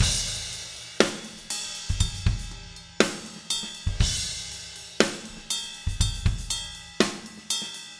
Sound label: 060 Ballad Drums Chorus; Pop Rock; Slow Tempo; Digidesign; Pro Tools; Pro Tools Essential Loops